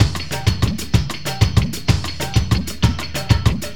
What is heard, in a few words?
FX kit, beats; Sonic Foundry (Sony Creative Software); World Pop